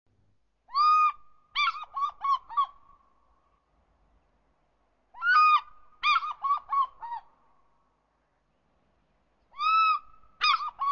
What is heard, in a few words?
Fish Eagle